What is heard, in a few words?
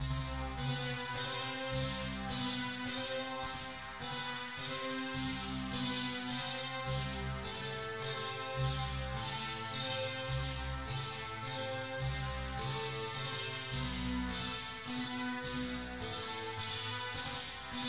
Waltz